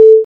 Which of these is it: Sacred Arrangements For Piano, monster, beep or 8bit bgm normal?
beep